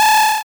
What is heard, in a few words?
Break Charged